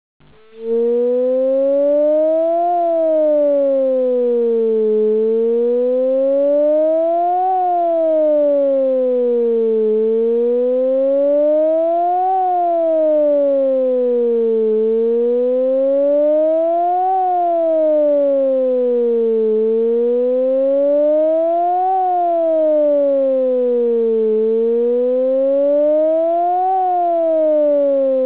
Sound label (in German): Warnung, sirenen